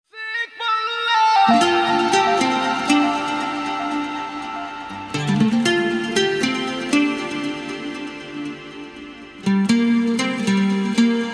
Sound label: Bollywood Mix